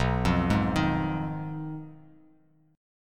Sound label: B 6th Flat 5th B down, chord